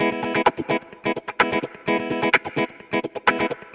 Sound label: Electric Guitar Kit E maj, 128BPM; Vengeance Samples; Special Sounds